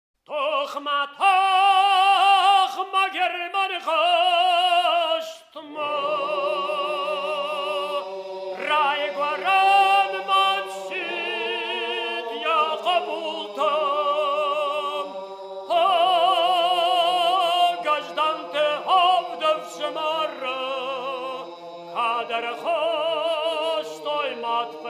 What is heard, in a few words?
ossetians, polyphony